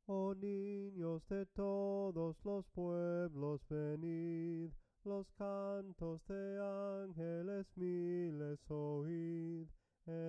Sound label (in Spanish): SOPRANO CANTADO, himnos en melodia soprano